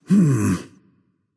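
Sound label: non verbal thinking, voice; cernunnos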